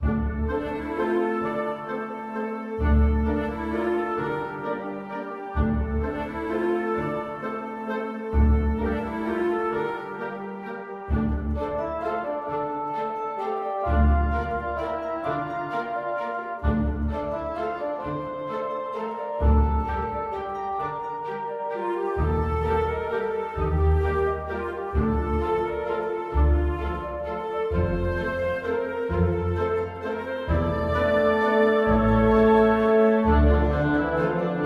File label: Forest Dark; Guardian Tales